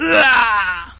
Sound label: hurt; hero1